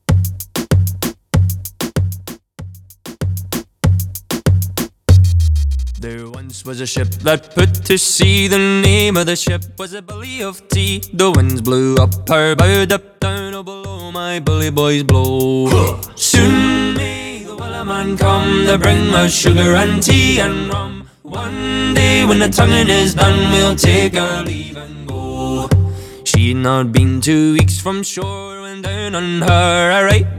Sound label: intro redrum 96 bpm; remixer from Nicaragua